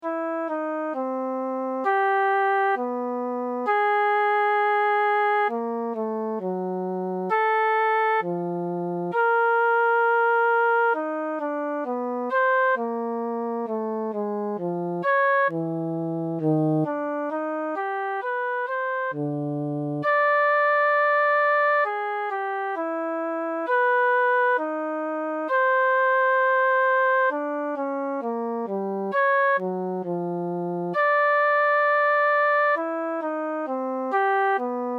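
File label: improvisations flute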